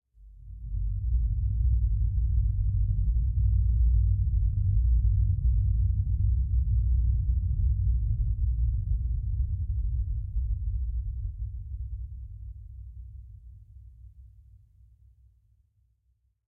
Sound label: Tension - low oscillating distortion; SoundMorph